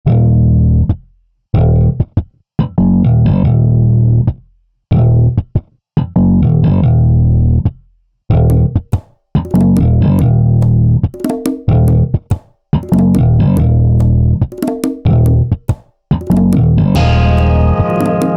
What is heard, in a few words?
FUNK